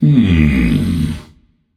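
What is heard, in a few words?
non verbal thinking, voice; cernunnos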